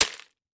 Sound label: Impact Debris Glass Debris Hit By Plastic Pipe